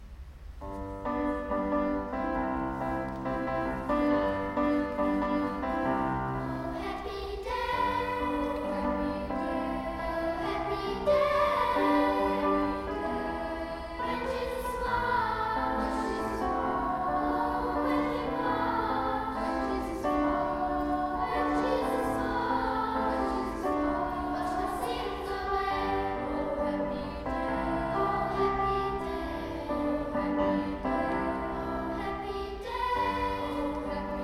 Unterstufenchor, O Happy Day; Konzertmitschnitt vom 19. Dezember 2023

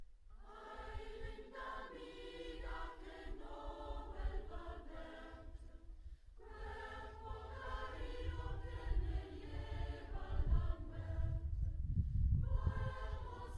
Chor und Jubilaeumschor; Ay Linda amiga; Anonym Siglo XVI

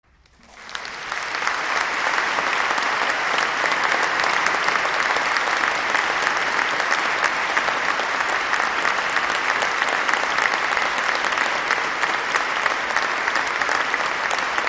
APPLAUS; Streichquartett; Lascia ch'io pianga; Georg Friedrich Haendel

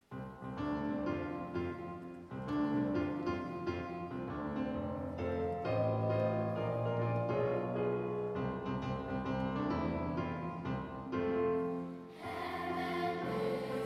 Unterstufenchor

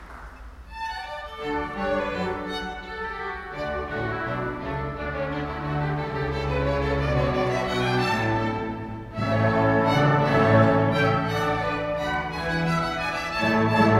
Orchester, Sinfonie, Tempo di Minuetto; Konzertmitschnitt vom 19. Dezember 2023

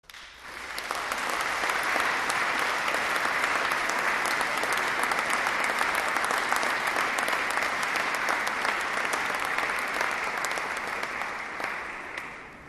Applaus; fuer Chor und Jubilaeumschor; Konzertmitschnitt vom 20. Dezember 2016

